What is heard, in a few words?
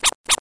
DOG; windog